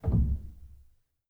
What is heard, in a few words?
rel, Grand Piano; bitKlavier Grand; Piano Bar